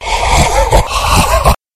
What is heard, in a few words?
monster stone giant